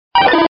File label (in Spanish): Grito de Poliwhirl